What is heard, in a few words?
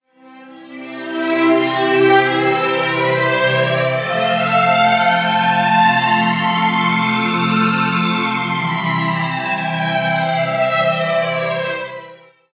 strings